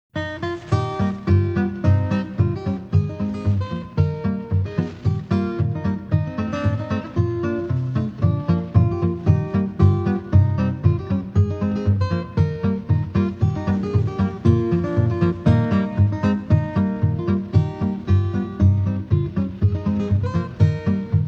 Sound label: Instrumental, country guitars; My Old Kentucky Home